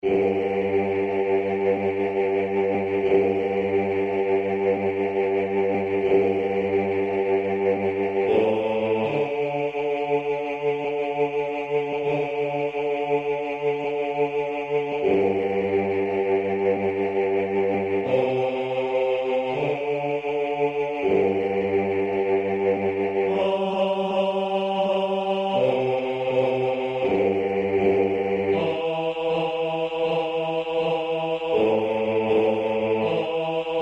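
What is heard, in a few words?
stornellata bass; Stornellata Parmigiana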